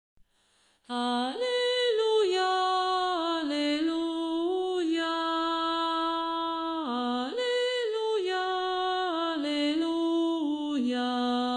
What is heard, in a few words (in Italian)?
alleluia uomini contralti